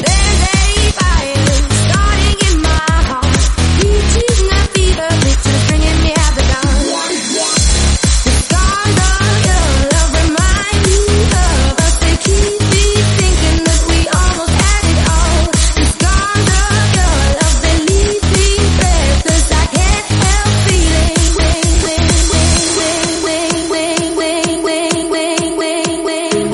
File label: 128bpm Dbm; remixplanet